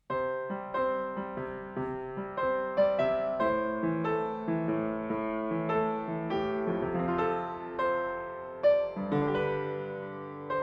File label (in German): Klangschatz Soundlogo